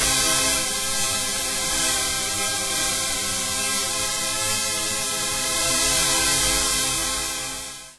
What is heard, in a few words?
Flanger Chord